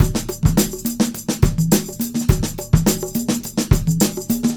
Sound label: Combo, R&B Drums